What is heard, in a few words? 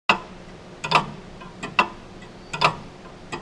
Clock